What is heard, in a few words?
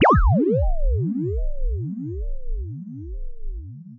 Laser Wrath